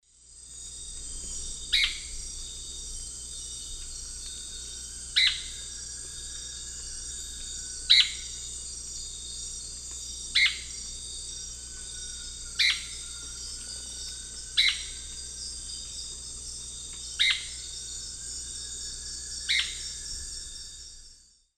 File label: Liosceles thoracicus, calls; S. America; Eastern Lowlands